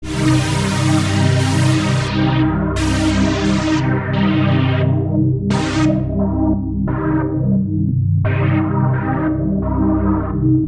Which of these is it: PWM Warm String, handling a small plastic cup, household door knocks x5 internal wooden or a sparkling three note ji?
PWM Warm String